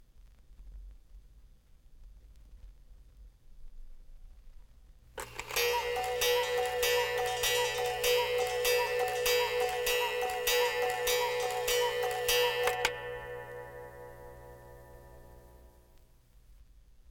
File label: Cuckoo Clock Strikes Twelve; Jac Holzman; Authentic Sound Effects Volume 2